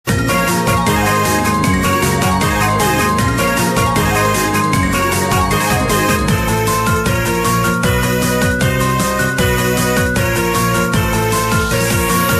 Bonus Night

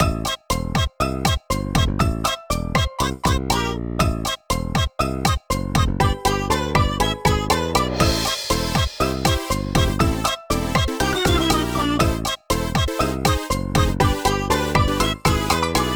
Bgm lobby rainbow mine

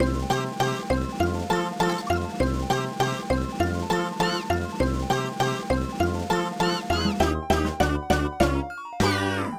bgm ui spin

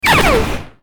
Dinosaur Transform End

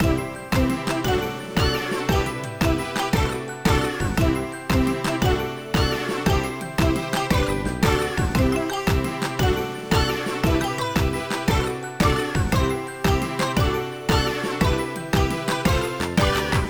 Bgm ui 1vs1 lobby

